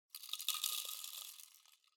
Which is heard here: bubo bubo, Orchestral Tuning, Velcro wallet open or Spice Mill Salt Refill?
Spice Mill Salt Refill